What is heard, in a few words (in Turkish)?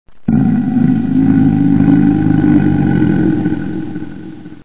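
ayı hırlama; hayvanlar; efekt; ayi hirlama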